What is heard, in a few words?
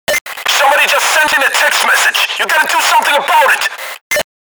Text message tone; Payday The Game; Bain; Somebody sent a text message